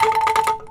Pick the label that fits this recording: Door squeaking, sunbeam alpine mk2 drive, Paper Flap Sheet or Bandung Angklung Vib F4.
Bandung Angklung Vib F4